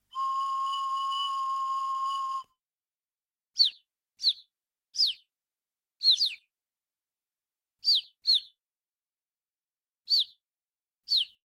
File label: fue suzume